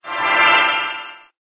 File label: magic life